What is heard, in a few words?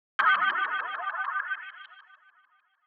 sfx, Smooth RnB Gmin; Big Fish Audio; Legendary; How Can I